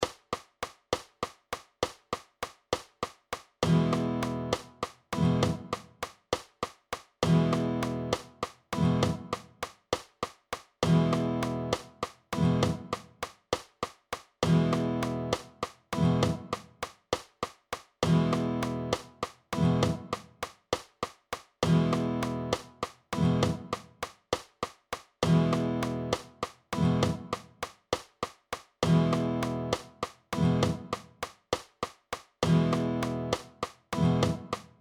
12 8 Strum Pattern

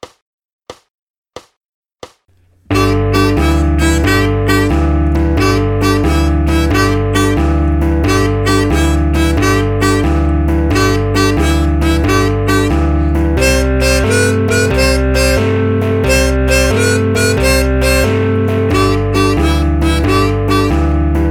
Blues 90bpm

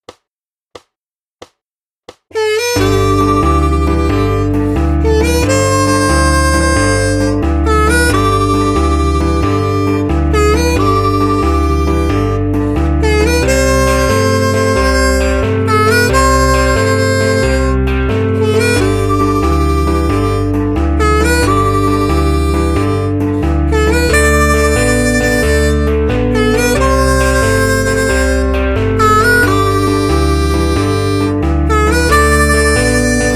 Vibrato Blues 90bpm

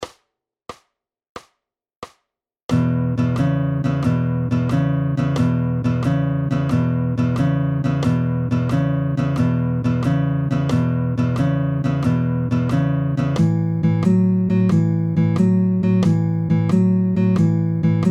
Simple Boogie Blues

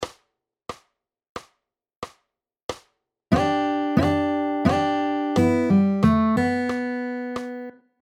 Pentatonic Riffs

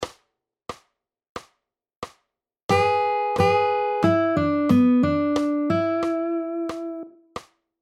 Pentatonic Riffs

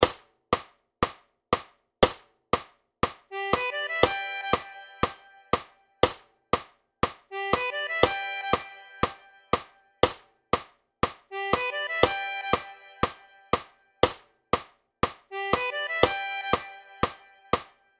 riff juke

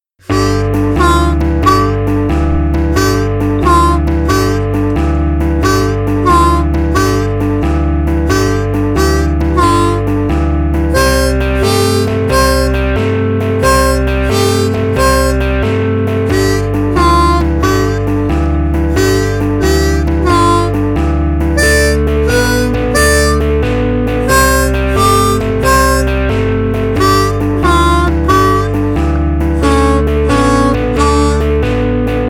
Bendy Blues 90bpm

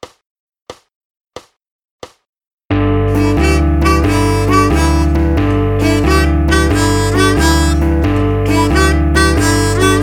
Blues 90bpm

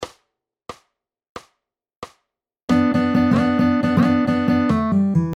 Pentatonic Riffs

